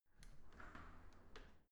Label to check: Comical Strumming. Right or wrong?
Wrong